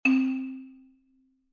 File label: tone